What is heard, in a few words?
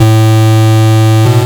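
bass distortion A3; LIMAT LSDJ kit; LSDJ Extra Bass Notes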